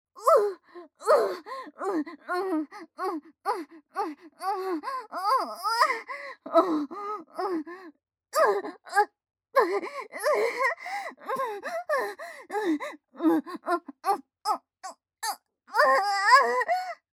完堕ち喘ぎ; アイリス; 完堕ち喘ぎ2

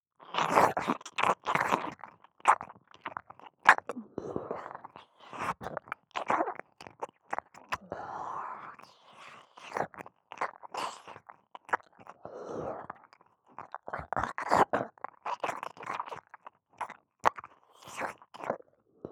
耳舐め （右）; ネモナ

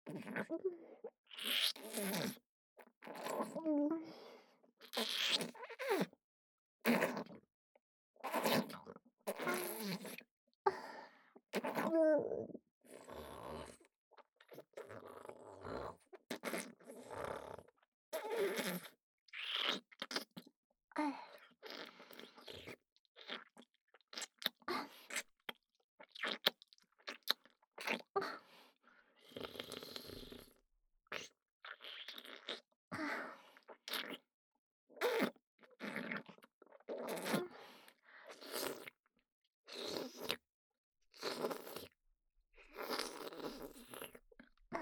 ツンデレ ねっとりフェラ, ASMR; フェラ素材

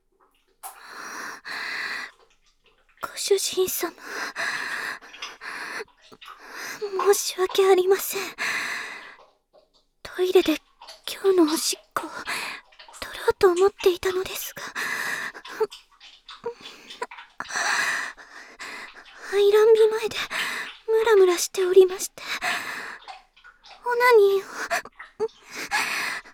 トイレでおしっこ＆潮吹きオナニー

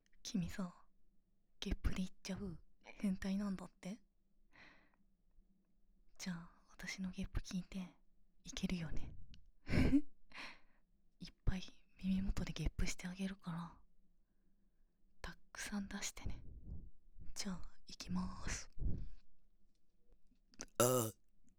ゲップ

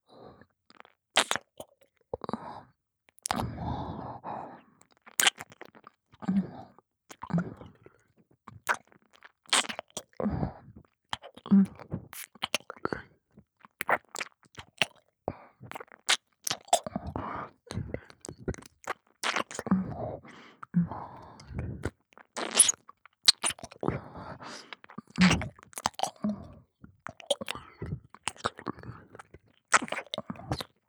耳舐め・普通, ASMR; loop音声

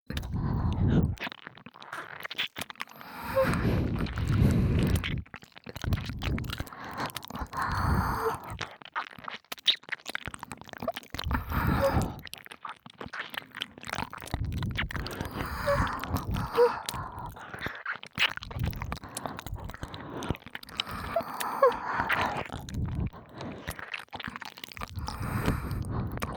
(両耳)吐息たっぷりまったり耳舐めエッチ, 黒耳 パンパン系効果音版; 舐め音比べ

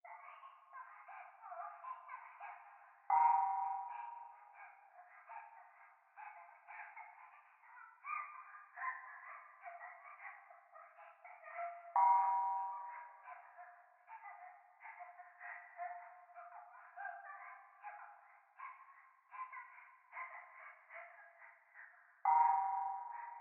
教会 可愛い喘ぎ 寺

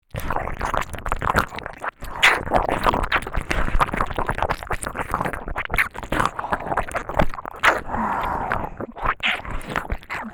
両耳舐め; とびきりの溺愛力…千年魔王のナユタ様に籠絡されて、褒め褒めあまとろセックスで守られ続ける物語♪; 魔王の術。永遠に続く

